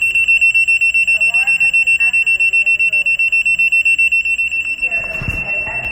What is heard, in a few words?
Emergency Door